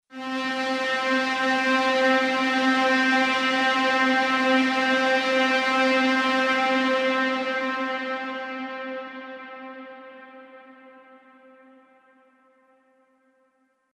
Envelope 1 Bar; Elysion 2; Brilliant Europium

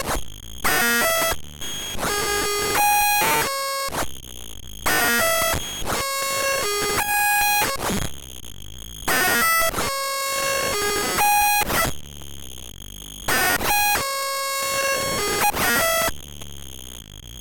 SV 1 Evil Lifeform; Pittsburgh Lifeforms; Achtung

